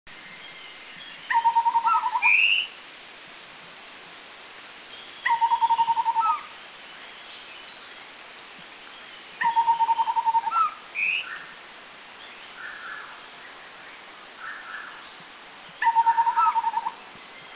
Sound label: Taiwan Scimitar Babbler Anmashan